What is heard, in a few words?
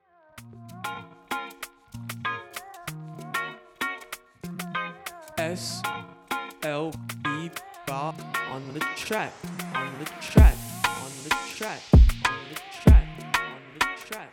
Vibin